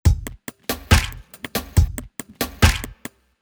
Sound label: Drumloop, Dubstep; GhostHack